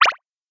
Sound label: Beeps